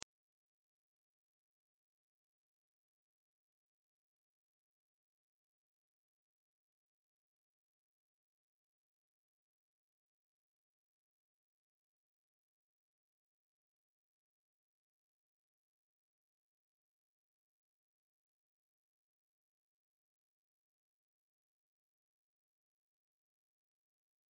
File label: Test Tone Sine; Test Tone Sine Wave 10Khz 60 Sec -12Db Loop